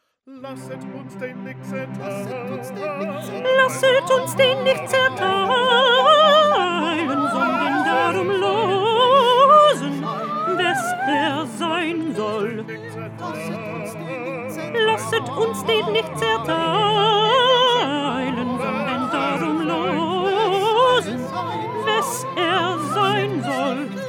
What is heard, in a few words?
Alto; Bach; St John Passion